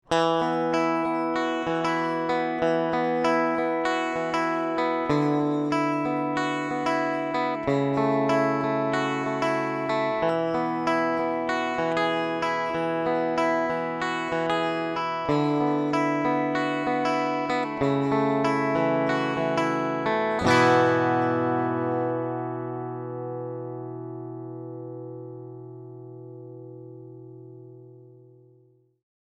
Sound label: Super Sonic and Vibratone; fender